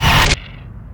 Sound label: Banshee Mine Homing End; Evolve; Kraken